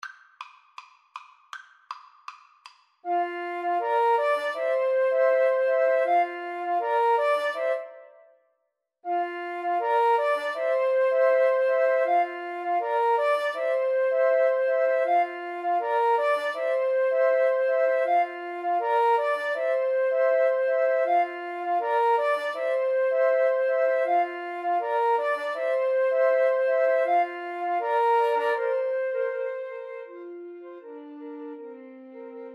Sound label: la bamba, Flute Trio